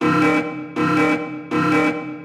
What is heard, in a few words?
aircraft alarm